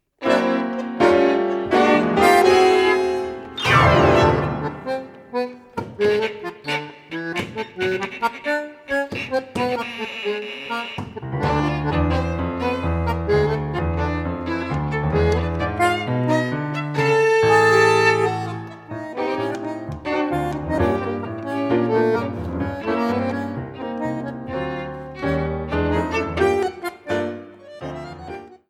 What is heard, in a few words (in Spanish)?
Tango progresivo